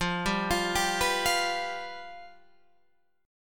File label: F Suspended 2nd Flat 5th, Guitar, Open G